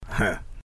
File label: heh